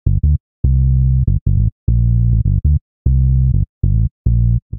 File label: bass G minor 89 bpm; MIDI Clips; Bassline; Beat That; Ableton; Factory Packs; Chop and Swing